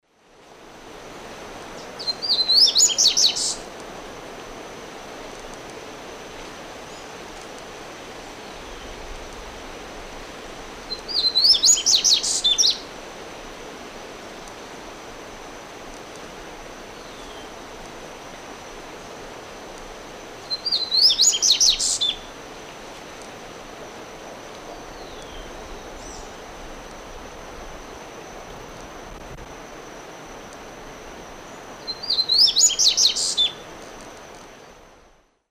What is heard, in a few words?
Sporophila nigricollis, song; S. America; Eastern Lowlands